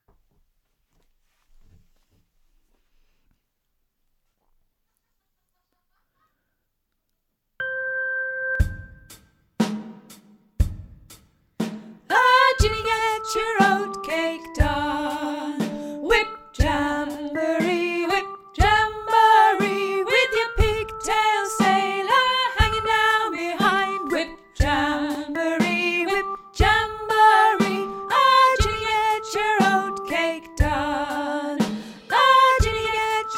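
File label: Whip Guide Soprano, sea shanty singing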